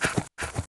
Move Sound, Seaman; Heroes3

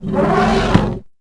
War Mammoth Attack Sound; Heroes3

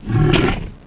Ancient Behemoth Defend Sound; Heroes3

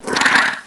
Troglodyte Hurt Sound; Heroes3

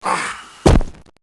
Zombie Death Sound

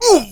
Hurt Sound, Seaman

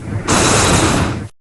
Air Elemental - Attack Sound; Heroes3

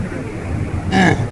Air Elemental Hurt Sound

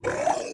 Sandworm Defend Sound

